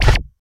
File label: Future Gun Shot Sing, futuristic